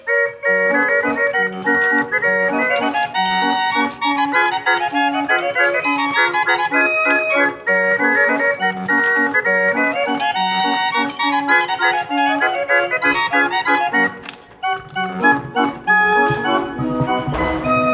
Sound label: Barrel Automata Organ, Mechanical Instruments; Speelklok Museum; Bruder